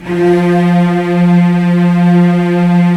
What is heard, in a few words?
STR, Roland LA Composer; Roland - LA Composer vol. 4